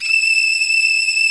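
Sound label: arcato string; OMI - Universe of Sounds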